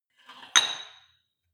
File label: weight rack insert weight hard, fitnessstudio